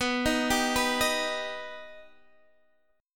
B Augmented B, chord; arpeggiated; 19,18,17,16,16,x

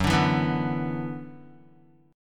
Gb 7th Suspended 4th Sharp 5th Gb Guitar; strummed; JGuitar

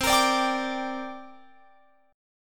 C 7th Suspended 2nd, Guitar, Open F; C7sus2 strummed

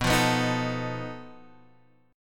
B 7th Flat 5th B Guitar Half Step Down; B7b5

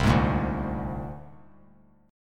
C 7th Suspended 2nd Sharp 5th C down; C7sus2#5 strummed; JGuitar

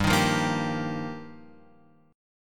G Minor 7th Sharp 5th, Guitar, Open F; Gm7#5; strummed; JGuitar; x,2,3,0,1,2

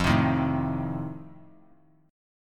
F 7th F down; F7 strummed

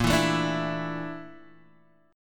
A sharp Major 7th Flat 5th, Guitar Open G

